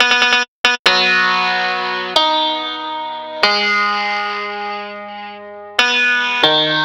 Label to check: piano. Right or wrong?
Right